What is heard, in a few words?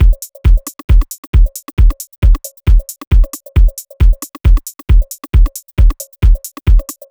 Percussion